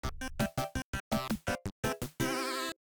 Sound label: game over; MrsPacMan